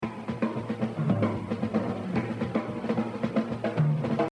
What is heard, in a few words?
Exercise, jazz drumming